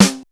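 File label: snare drum low; SNAREDRUMS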